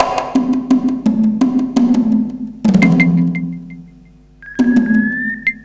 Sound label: conga curlew, 85bpm